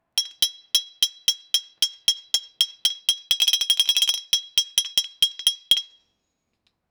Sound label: trident hitting stone flo